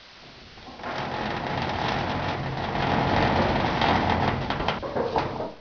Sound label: creak, ambience